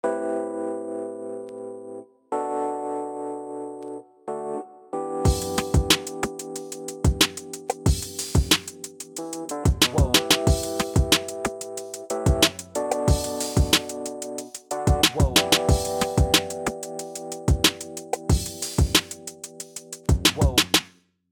minimal kit; misfit mix

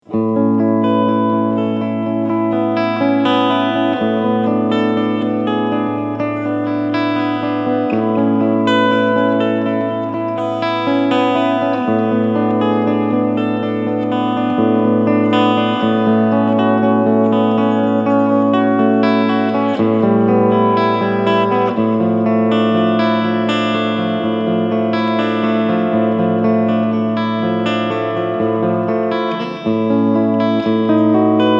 Harley Benton Streetbox 60 Delay

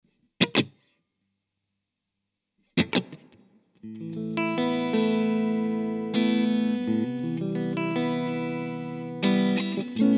Reverb

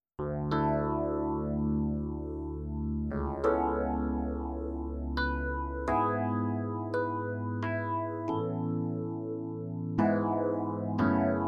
Numa Stage EPiano

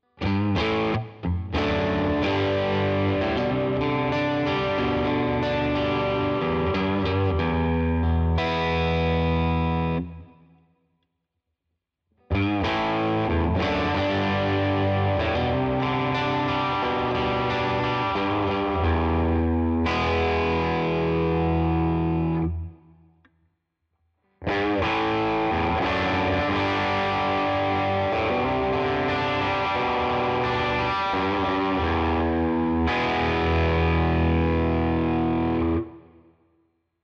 mid-gain Riff; Cort MBM-2H

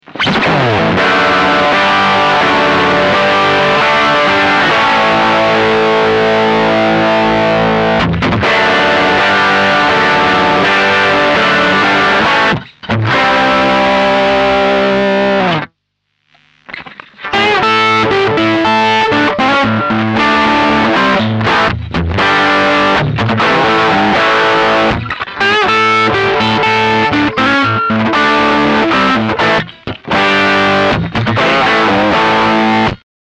Pedaltone + Power

